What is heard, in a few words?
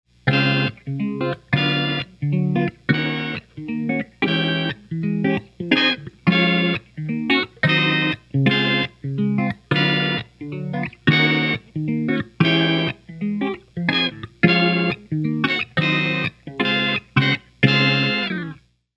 Strum Trigger, toggle